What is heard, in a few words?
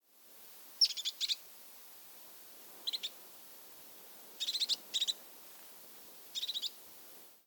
european crested tit - lophophanes cristatus